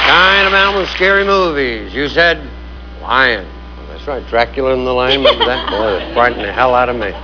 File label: Lion